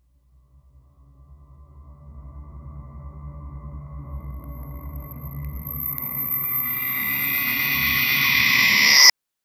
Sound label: a psychic mind control ef